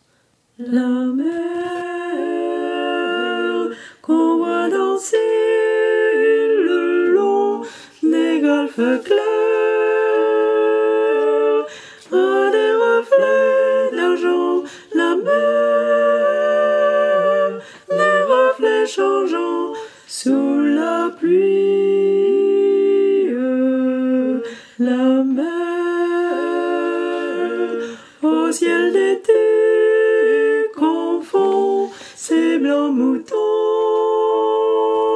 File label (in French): soprane